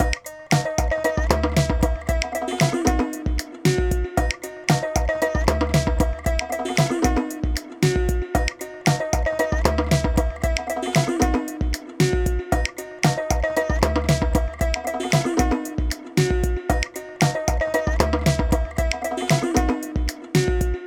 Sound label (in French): Blues Mono Corde